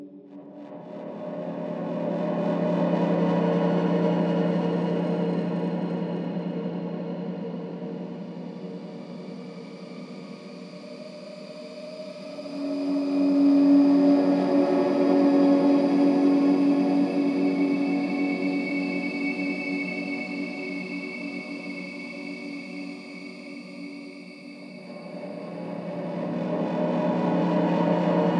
Canyon Ambience; SOMA

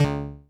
UI Error Double Note Down; Rabbids Coding

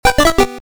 Flower get; curse crackers for whom the belle toils switch windows gamerip 2022

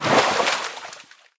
Character Footsteps; Unreal Development Kit

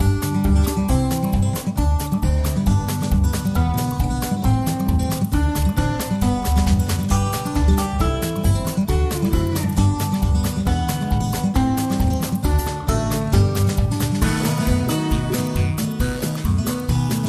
Minigame Lottery; Green Farm 3

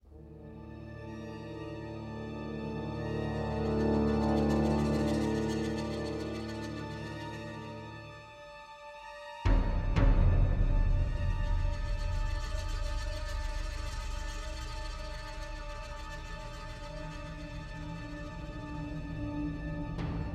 Ambient Idea Nonloop

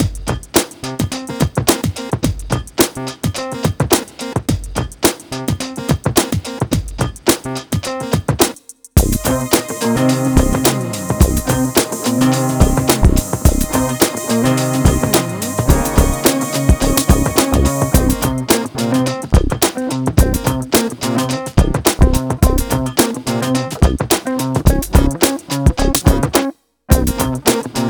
Instrumental; NBA ShootOut 2003; ps1; ps2; ShootOut 2K3

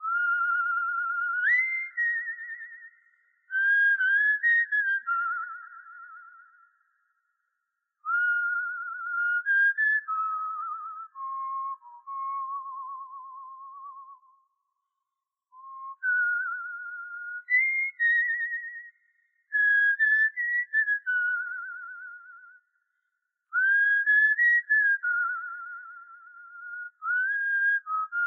Whistle Solo; west of loathing

